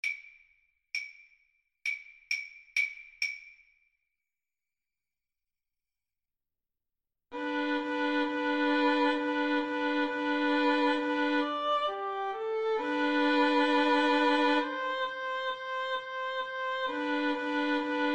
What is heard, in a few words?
jingle bells boogie violin cello duet